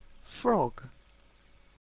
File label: frog